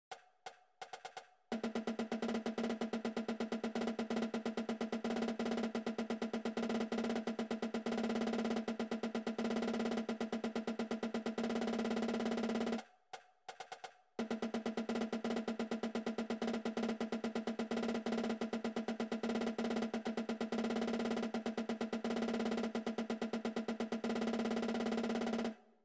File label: Rolls